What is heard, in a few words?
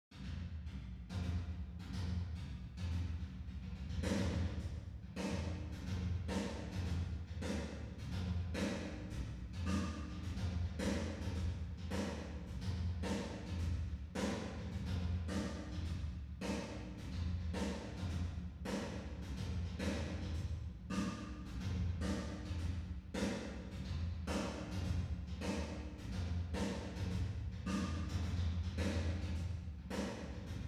room reverb; AugustRain